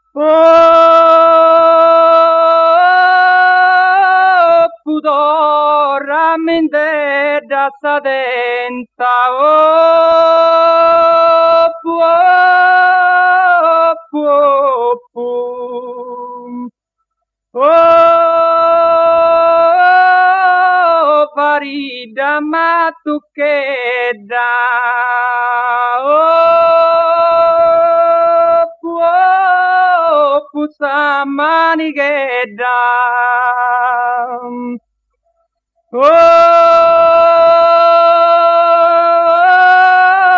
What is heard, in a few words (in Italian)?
canto a tenore; archivio rai sardegna; musica strumentale